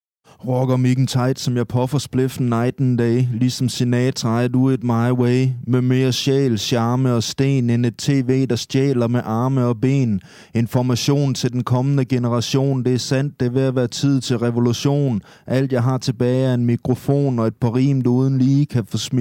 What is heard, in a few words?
rap